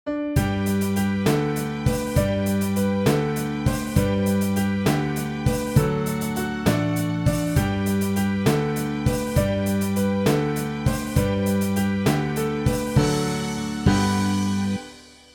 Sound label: 3 Ships, keyboard